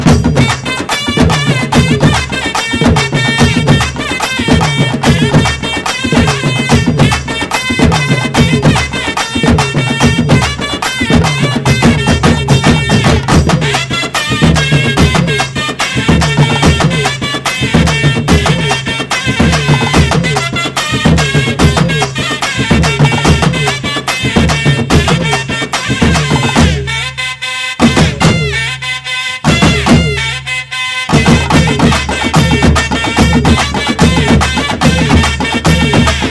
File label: Drum Beats Ringtone